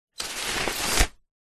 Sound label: foley umbrella open